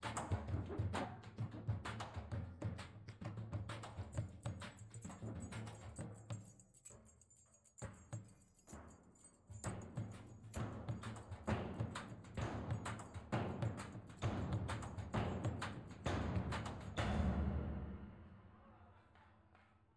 stomp Modulator; Mono

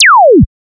impulse response

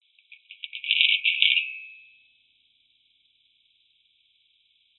highpass rasg 80 percent slower, rasgueado

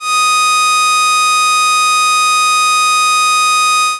sawtooth fundamental 1245; integration order0; lagrange order0

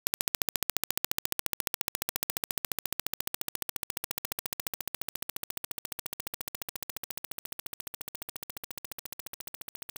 impulse response

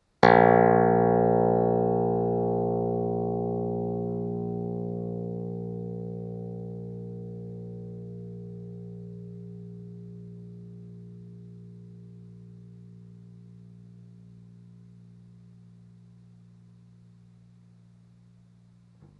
samples whole keyboard, clavinet

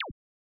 impulse response

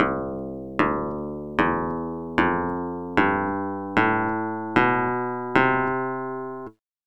scala C2, clavinet

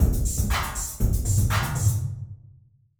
drums

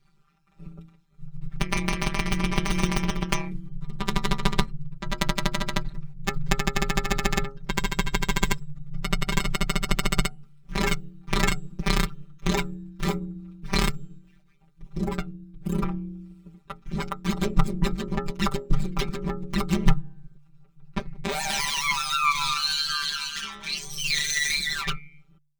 transients; WOLA

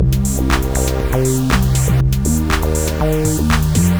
music unprocessed

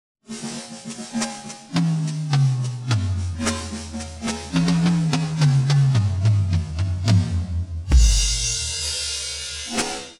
drumsolo

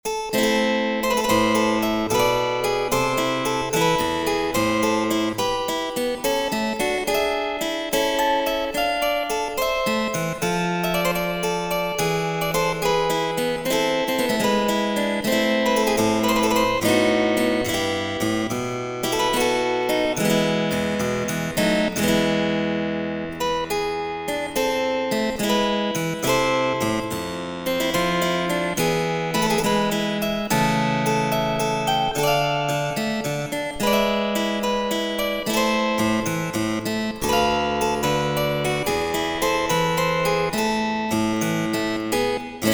harp; antiquing

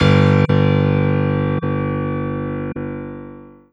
Piano Low

